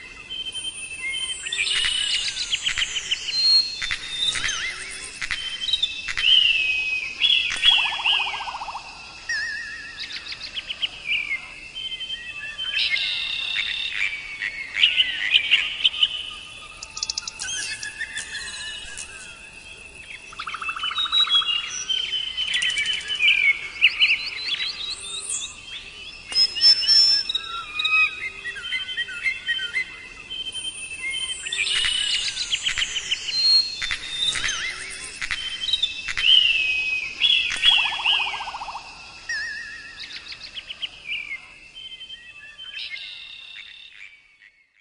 Ambient, Birds in the Woods; rayman 2

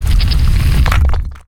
Ruins Temple Shell Raise

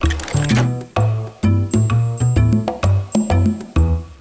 Ice Door Close; Fisher Price Ready for School Kindergarten

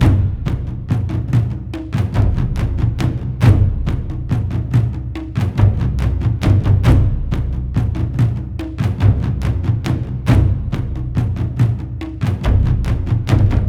Drums; For Honor

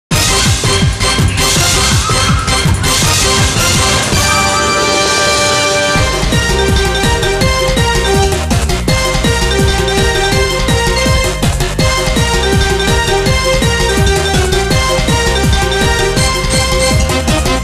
Savanna Park BGM; pachislot jyuuou oujanokikan

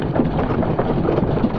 Wooden Bridge

